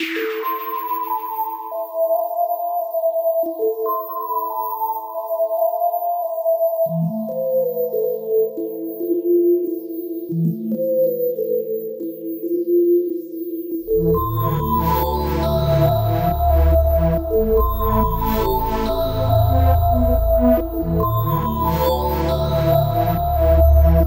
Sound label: sci-fi score